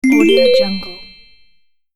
the correct answer

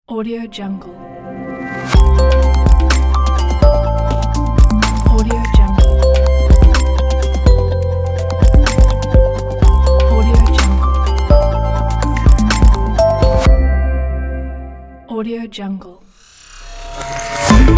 easy corporate high technology logo pack

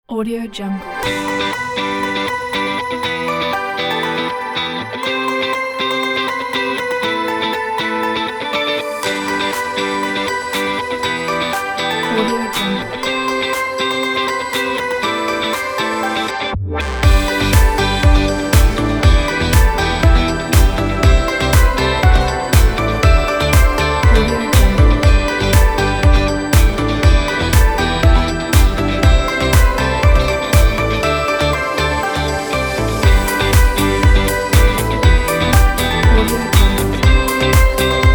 jingle bells pop dance upbeat

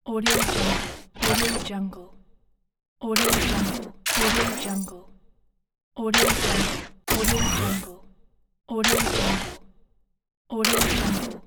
plasma rifle reload

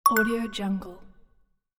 interface notification; minimal-studio

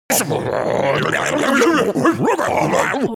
creature troll excited trolls

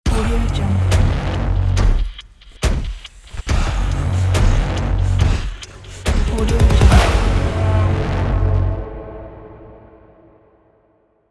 viking action logo

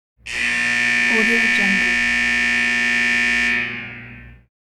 long factory buzzer

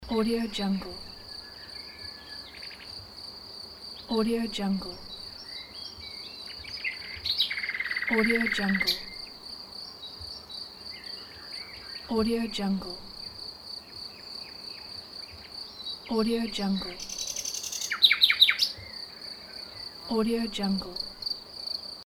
birds in nature

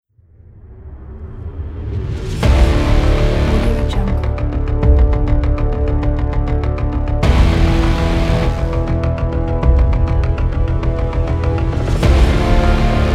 trailer intro ident

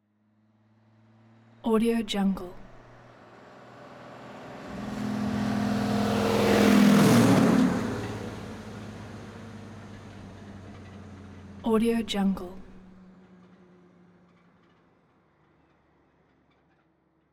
semi by fast

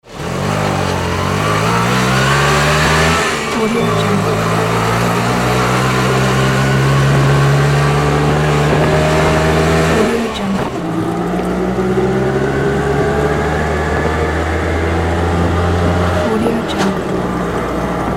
rickshaw three wheeler sounds

